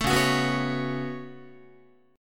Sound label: Db 7th Suspended 4th Sharp 5th Db down Guitar; Guitar-4A,4E,5A,5D,5Gsharp,6Csharp; Db7sus4#5 strummed